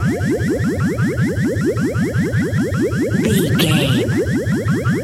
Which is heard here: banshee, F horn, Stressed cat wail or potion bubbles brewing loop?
potion bubbles brewing loop